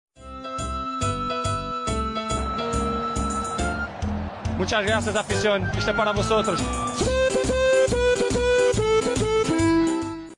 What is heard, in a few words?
jingle